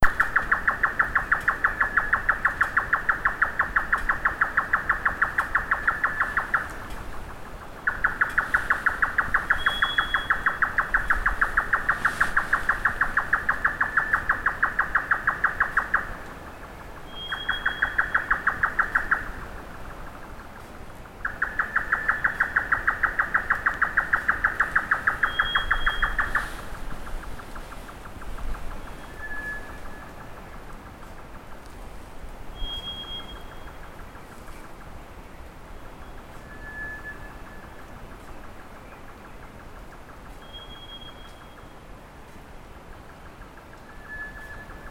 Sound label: nightjar grey Caprimulgus jotaka